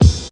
rich top end kick drum one shot e key, kick sound tuned to the E note